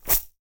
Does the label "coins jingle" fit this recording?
Yes